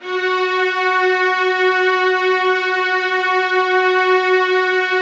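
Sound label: violas sustain f#4; Sonatina Symphonic Orchestra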